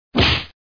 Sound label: punch